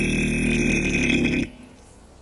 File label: Stegosaurus, Dinosaur Roars